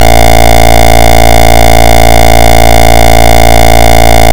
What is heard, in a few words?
validate emission receiver